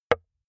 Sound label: metal impact wood light